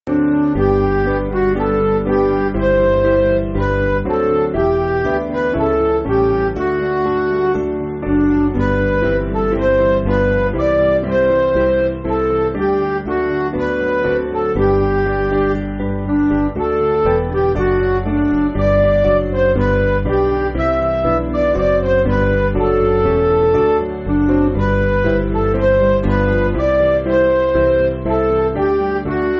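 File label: Music Backing Files for Churches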